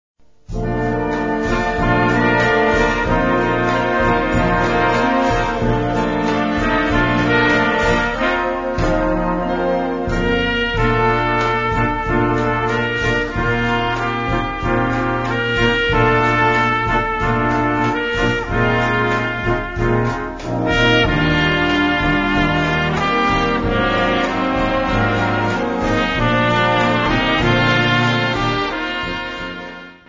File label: ballad for trumpet